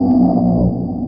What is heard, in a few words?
Industrial Bass, Drum N Bass; Sonic Foundry (Sony Creative Software); Knutrix Drum & Bass